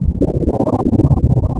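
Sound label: engine loop; ships; Quantum Redshift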